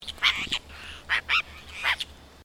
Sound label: Bird Apostle Bird Call; Various Apostle birds; Animals